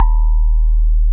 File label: TINE; DIGIJAZZ; East West - Twisted Textures-BT CD1